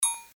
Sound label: turntable game